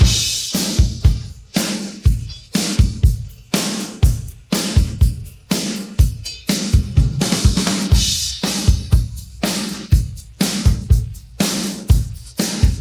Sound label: pattern, drums